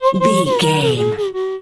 comedy siren whistle fun sounds

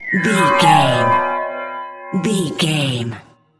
fear confuse horror scare

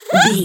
goblin fairy effort jump action

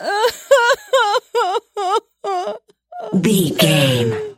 voice female cry

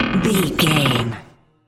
metal low creak squeak

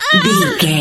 voice female death

